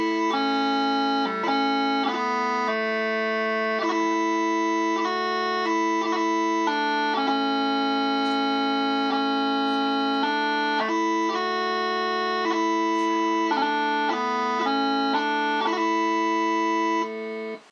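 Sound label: Bide With Me, bagpipe tunes; celebration of life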